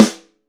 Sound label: Tama hard; Best Service; Real Mega Drums VOL-1; WHGM KIT 4